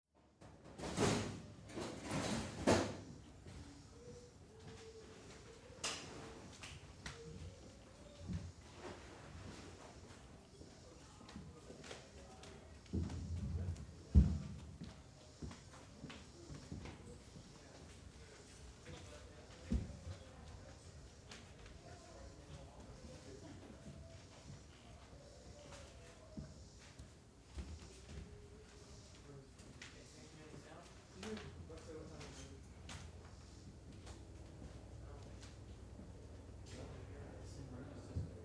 Barracks Locker Room, Barracks Locker Room Ambience